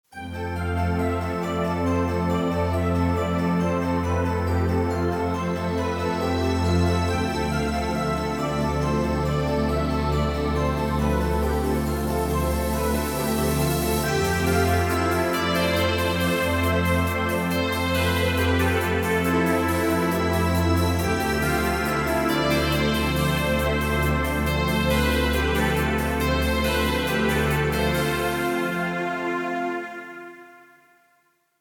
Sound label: Inspiring Movement Background Music